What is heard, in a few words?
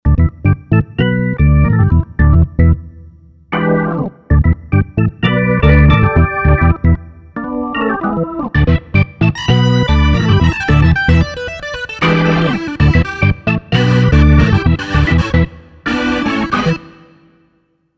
Roland VK 8M